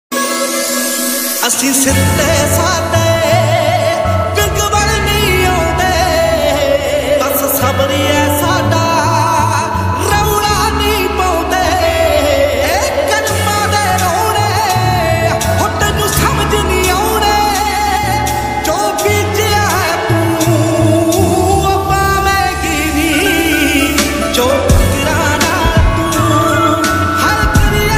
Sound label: punjabi song ringtone